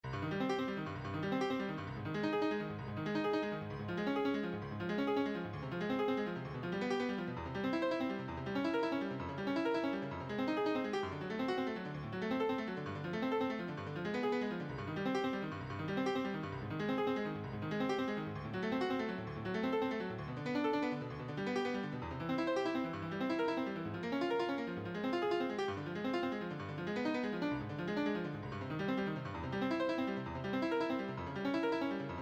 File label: etude fast